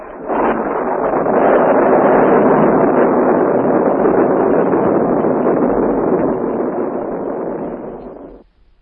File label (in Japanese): bakudann